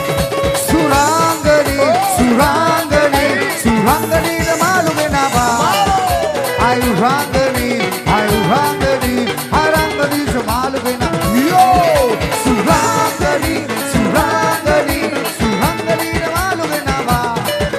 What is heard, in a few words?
live show ringtone; english ringtones